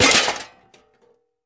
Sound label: Junk Thrown Box, junk crashes